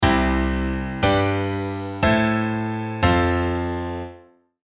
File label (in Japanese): レット・イット・ビー進行